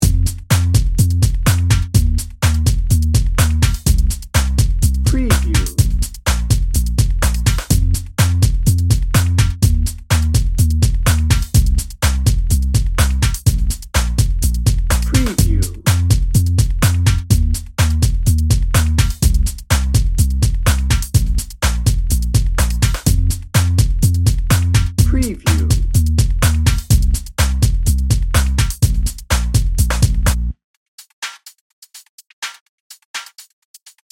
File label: atmospheric ambrosia dnb